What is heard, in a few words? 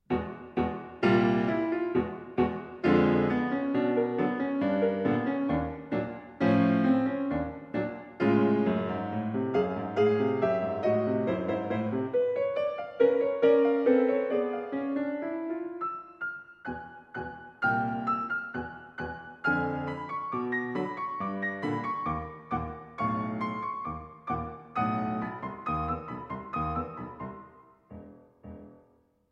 country dance